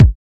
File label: perfect kick drum one shot A key; kick drum samples